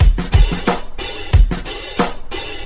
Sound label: hello phone call, funky drum loops